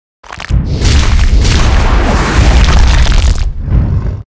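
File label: arcane golem attack, monster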